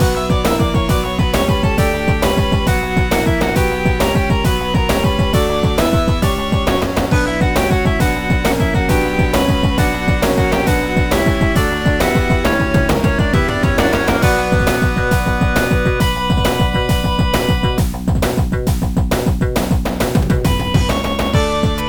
Sunken Ship Boss Loop; ravva and the phantom library

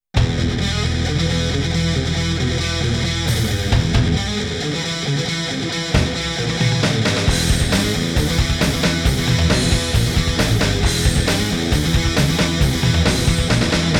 Character Select Screen; Guilty Gear; Mince

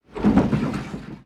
Window open; The 39 Steps

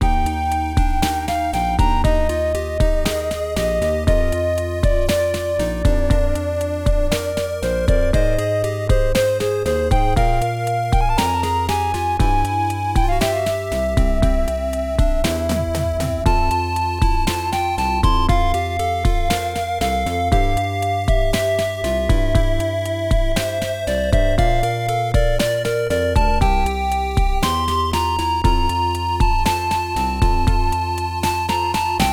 Peaceful Music, OPN Version; Gakuen Triangle

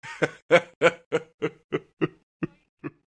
Laugh

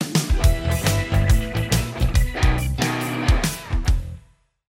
Cheese Rock

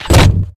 gear big

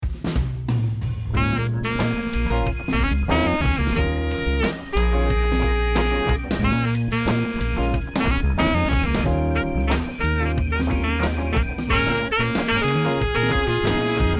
Conversation Music; YIIK A Post Modern RPG

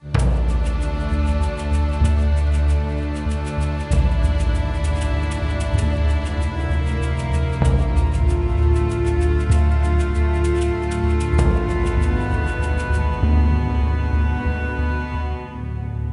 tribal; dragon riders chronicles of pern 2001 dreamcast gamerip

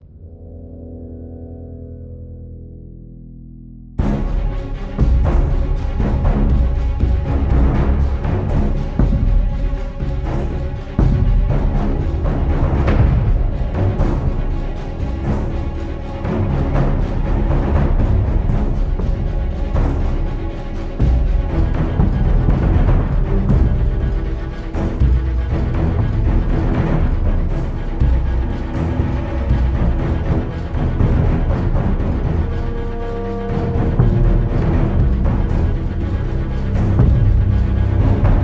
Loop Fight

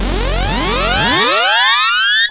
Beautynoise; tempest 4000

